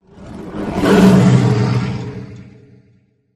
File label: Animal Stinger Big; stingers & whooshes